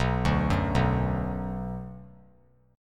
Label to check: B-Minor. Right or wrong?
Right